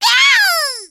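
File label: pain critical death; voice packs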